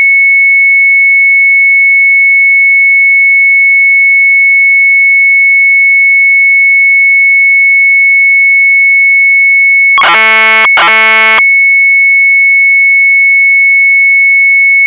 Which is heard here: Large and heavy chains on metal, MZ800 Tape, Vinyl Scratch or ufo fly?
MZ800 Tape